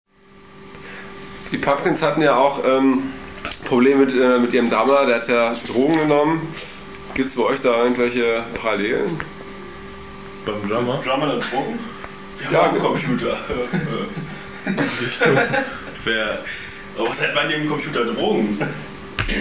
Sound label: drums